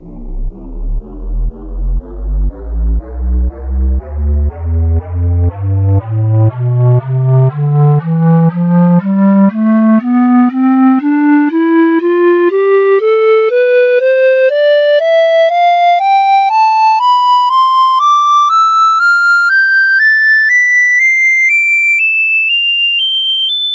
pan flute tonleiter

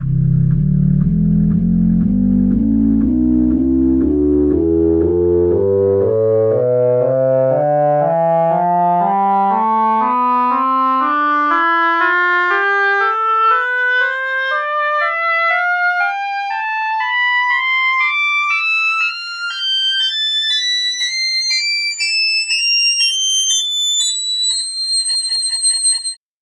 oboe tonleiter

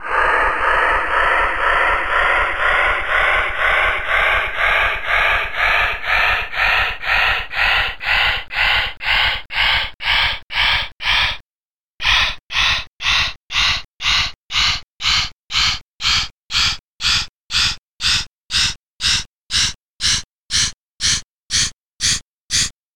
breath noise tonleiter

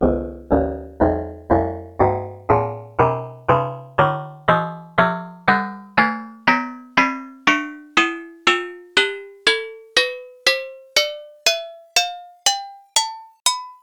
agogo tonleiter

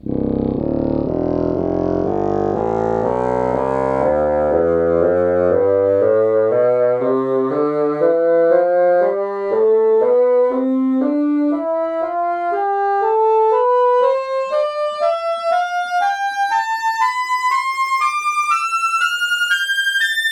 bassoon tonleiter

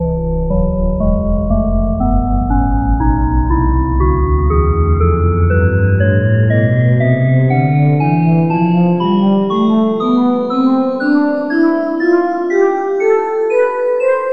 bowed glass tonleiter

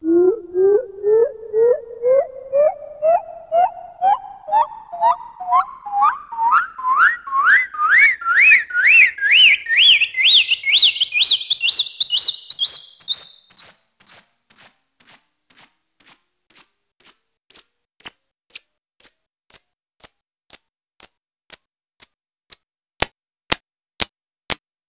bird tweet tonleiter

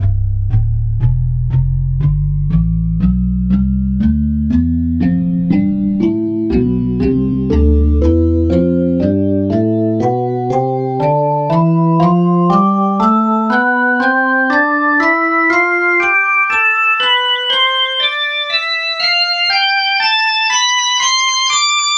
percussive organ tonleiter

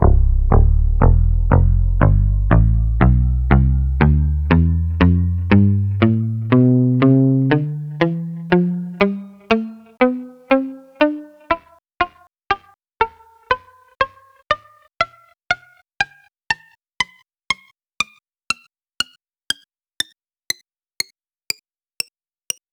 palm muted guitar tonleiter samples

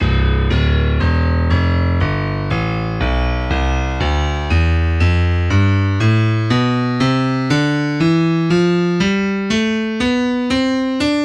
bright yamaha grand tonleiter; SpectMorph Evaluation #4

